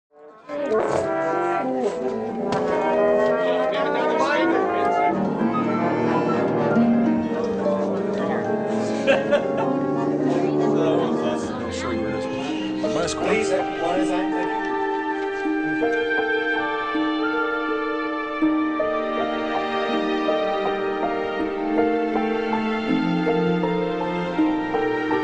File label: Orch Montage